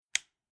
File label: Household Domestic Light Electric Switch On